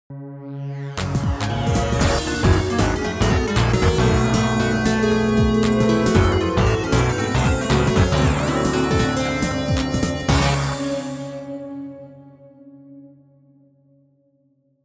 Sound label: Intruder Confirmed; gun point; Crosswire (Unused)